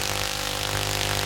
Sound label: Elec Buzz loop; Puddle